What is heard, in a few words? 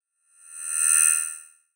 Sci Fi Retinal Scanner Beam Ray Pass By Short; Tech